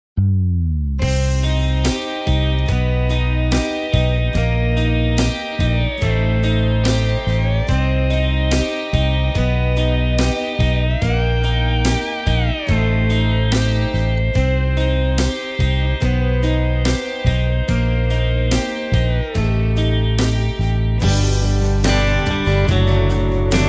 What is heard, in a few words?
Instrumental Sample; songs